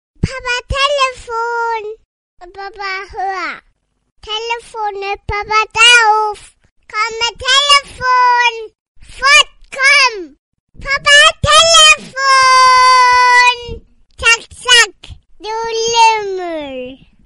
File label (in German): lustiger deutscher baby klingelton